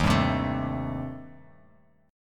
D sharp 6th Flat 5th, D sharp, down, Bass Standard; strummed; JGuitar